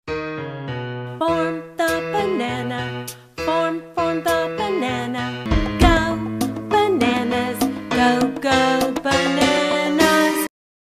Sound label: banana dance song; Banana Dance Song Clip